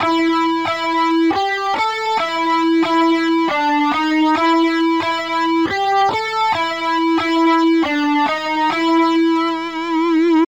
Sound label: Riff